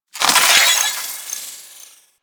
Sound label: Loot Container Break; Sentient; Warframe; Ambience; Sentient Devourer